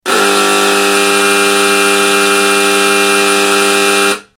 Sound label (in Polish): ton